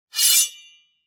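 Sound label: knife from sheath; Звук ножа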